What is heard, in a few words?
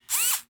robot servo; futuristic; ربات خدمت رسان